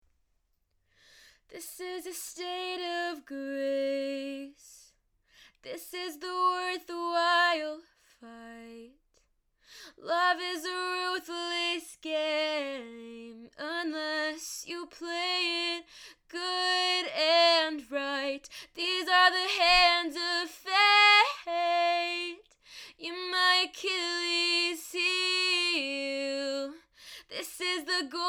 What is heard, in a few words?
singing; Roccat Torch cardioid